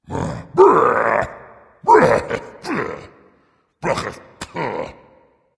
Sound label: grumble dragon; fafnir